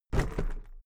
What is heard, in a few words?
briefcase plastic drop; industrie wirtschaft; bueroarbeit